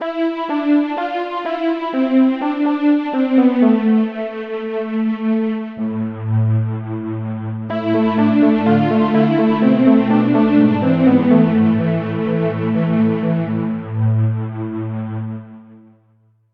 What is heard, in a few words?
polysynth